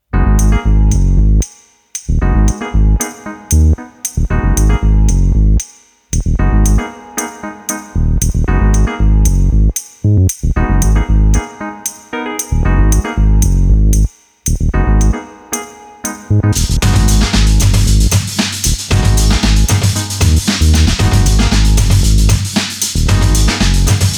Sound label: Afro beat; eMastered